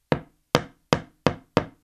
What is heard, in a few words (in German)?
5 mal haemmern auf holz